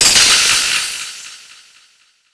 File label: feather spray attack; dogma